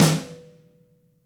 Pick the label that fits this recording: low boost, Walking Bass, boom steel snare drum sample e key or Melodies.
boom steel snare drum sample e key